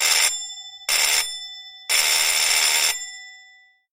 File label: industry bell chime antique; salamisound